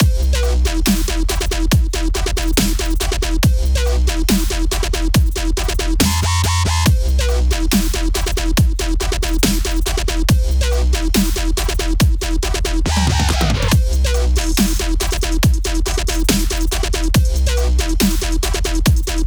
Dubstep Miniboss; Bogheim

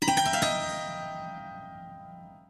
Raven activate; Redemption Cemetery Grave Testimony; iOS, MacOS, Windows

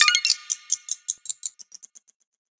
Time Pickup Reward; Minigame; Wavey the Rocket

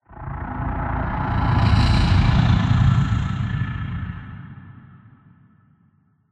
Roar Interior

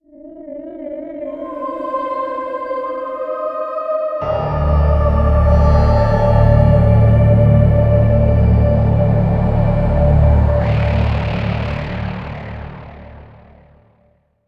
Round Start; Der Eisendrache

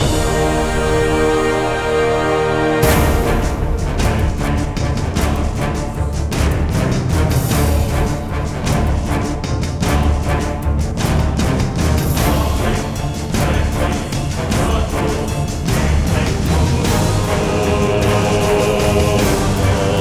music titan; Hercules

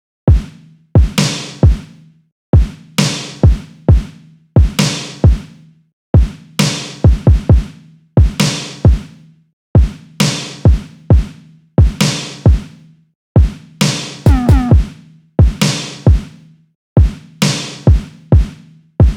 rock drums; wildcat gun machine ps4 switch windows xbox one gamerip 2022

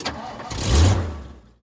vehicle suv ignition

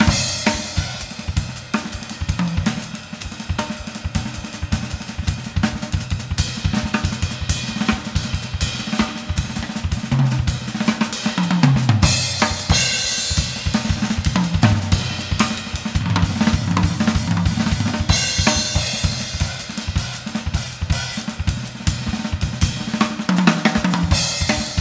mus mega battle drm; Stranglehold; PS3; Xbox 360

